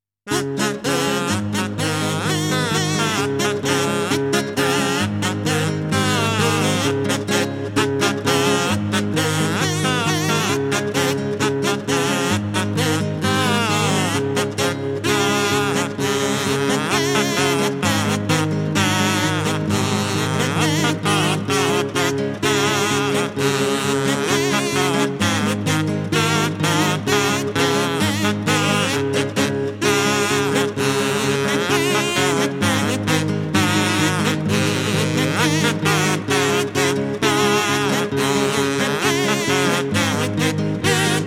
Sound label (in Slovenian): Stara Polka